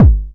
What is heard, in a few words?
KIK 909; E-MU Formula 4000 Series Vol. 5; Protozoa; Orbit Instrmt